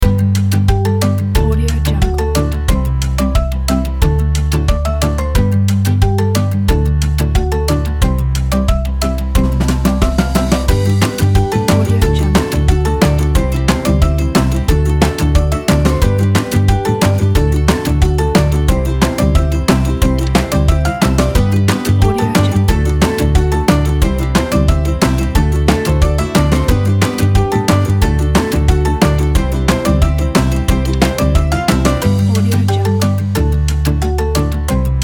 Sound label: happy game